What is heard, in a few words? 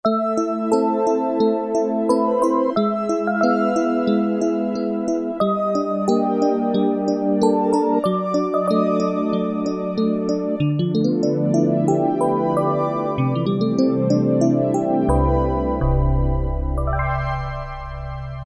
EP CINEMA, Casio VZ-10M; Internal factory combi